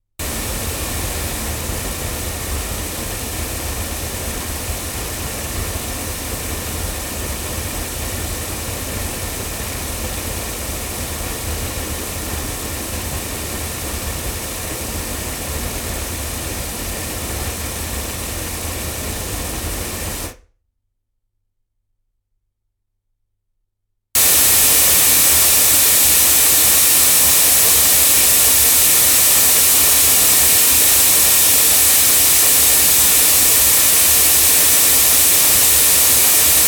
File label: cardioid loudspeakers